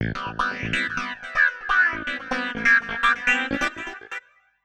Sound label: SYNTH VOCAL 125 A; Keys